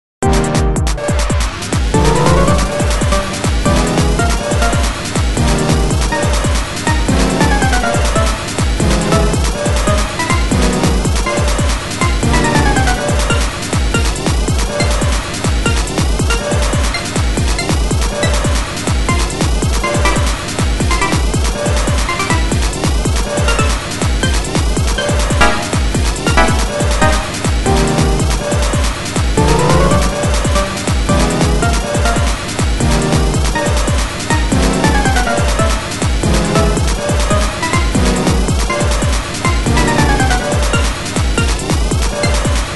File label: neurojazz